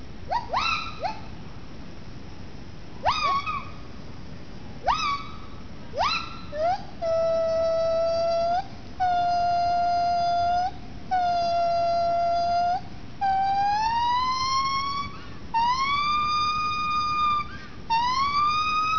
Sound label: Hylobates albibarbis; Hylobates albibarbis female songs